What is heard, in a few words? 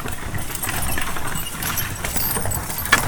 Horse Drawn Wagon; Electa Quinney